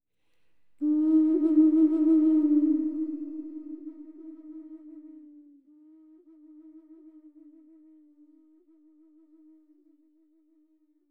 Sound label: Echo Flute; Flutes